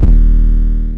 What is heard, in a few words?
Fuse Dist 808; 808s